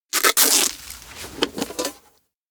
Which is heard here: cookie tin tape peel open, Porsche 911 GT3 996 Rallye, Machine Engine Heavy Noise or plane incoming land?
cookie tin tape peel open